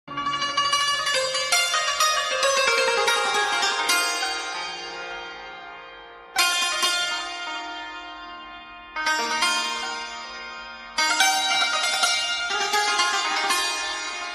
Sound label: Taknavazi Santoor